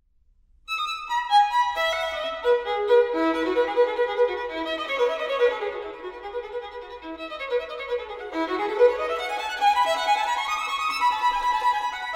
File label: Partita in E For Solo Violin, Preludio; Partita No. 3; BWV 1006